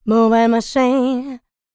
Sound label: LICKS, Vocal R&B & Dance; ILIO; Vocal Planet